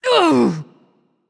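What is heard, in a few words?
grunt hit, female; smite; voicepack; hera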